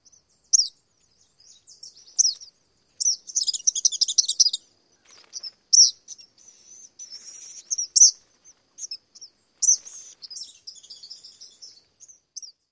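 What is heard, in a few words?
Eastern Kingbird various calls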